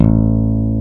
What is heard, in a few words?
BAS; Best Service Gigapack 2